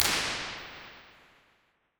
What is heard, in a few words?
rir